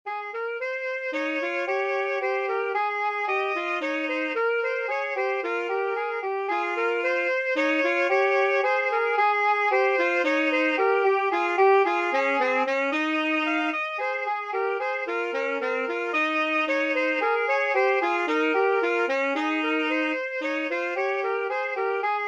Canon for two saxophones

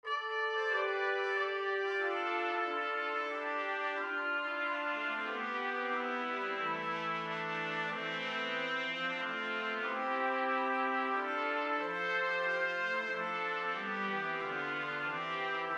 Brass Quintet; Nu moet gij allen vrolijk zijn

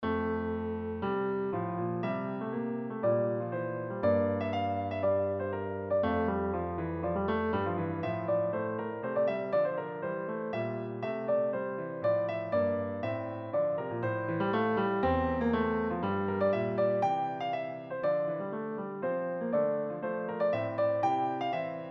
Canon in F

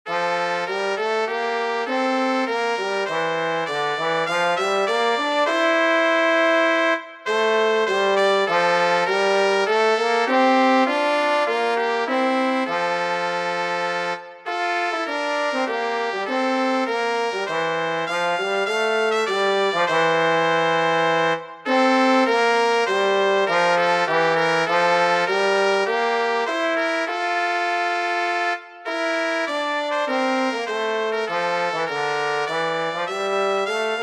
Brass for 2 3 trumpet and trombone

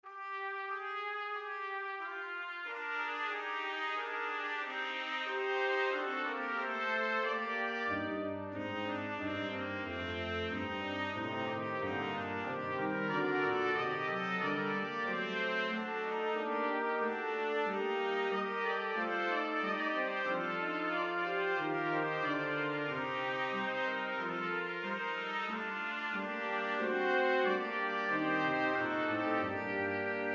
Brass Quintet; BWV 2.6 Ach Gott vom Himmel sieh darein

